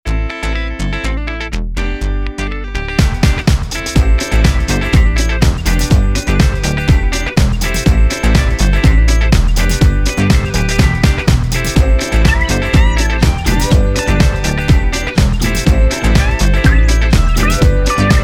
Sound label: techno